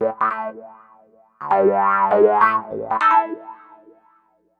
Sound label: Electric Piano, Loops, Keys